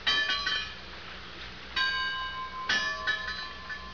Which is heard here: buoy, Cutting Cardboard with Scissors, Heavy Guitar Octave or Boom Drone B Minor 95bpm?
buoy